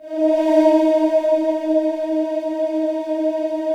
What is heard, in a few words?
Matrix 12 choir, vintage keyboards; Big Fish Audio - First Call Vintage Keyboards